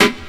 perfect steel snare drum a sharp key

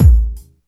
verby kick drum sound A key; kick drum samples

